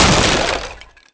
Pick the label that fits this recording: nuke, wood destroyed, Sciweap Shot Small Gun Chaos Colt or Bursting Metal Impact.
wood destroyed